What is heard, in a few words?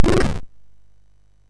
sid MOS6581R3; Gremlins 2-The New Batch